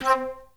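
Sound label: flute staccato C4; Best Service; Peter Siedlaczek's Advanced Orchestra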